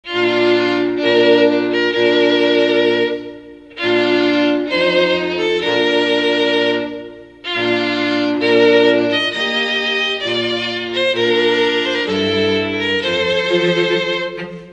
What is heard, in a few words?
Bridal Chorus